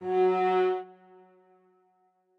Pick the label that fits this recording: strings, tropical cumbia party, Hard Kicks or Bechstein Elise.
strings